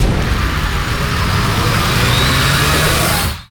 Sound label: near win riser; superstriker